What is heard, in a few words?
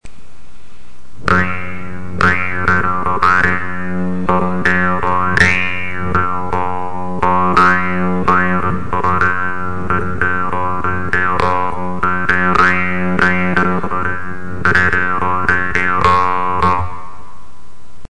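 jew's harp 2 strikes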